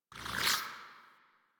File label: Weapon Show